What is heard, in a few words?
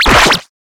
katana kill